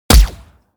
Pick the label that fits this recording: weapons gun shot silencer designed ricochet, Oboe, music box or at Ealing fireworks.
weapons gun shot silencer designed ricochet